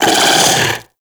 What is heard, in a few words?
Arachnoid Wraith Vocal Attack Short; Warframe; Corpus